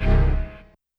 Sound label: Giant Stab L, YAMAHA RM1X